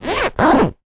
household cushion zip; zapsplat

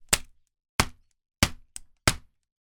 foley crutch single walk hit ground x4; zapsplat

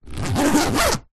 foley suitcase zipper

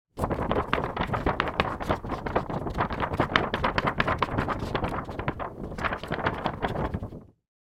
paper sheet shake wobble

